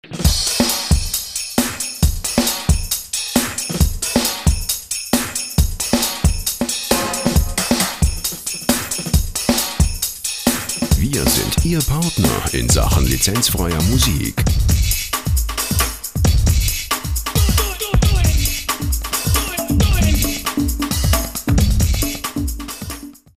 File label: drums